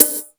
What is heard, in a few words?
MONDO PERC, METALIC DRM; Crypton - DrumBase5000; METALIC DRM2